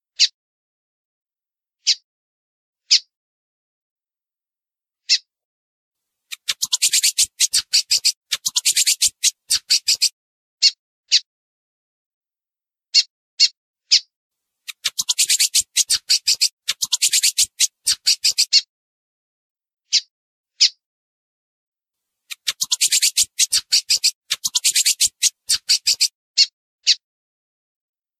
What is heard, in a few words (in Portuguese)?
Femea de Bigodinho Macheando para Esquentar Macho